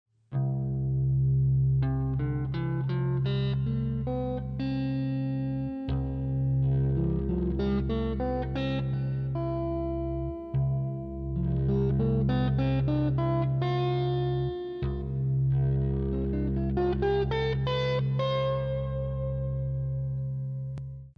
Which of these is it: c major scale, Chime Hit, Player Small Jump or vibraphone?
c major scale